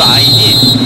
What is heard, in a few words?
whistle